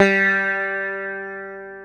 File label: EL SITAR G2, ETHNIC; AKAI S-Series CD-ROM Sound Library VOL-8